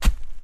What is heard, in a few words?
Heavy Footstep